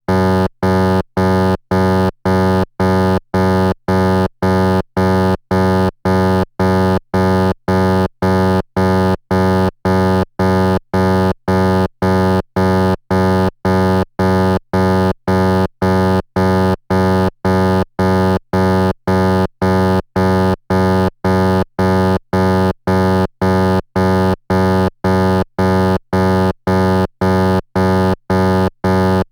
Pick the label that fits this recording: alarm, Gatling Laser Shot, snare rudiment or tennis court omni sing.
alarm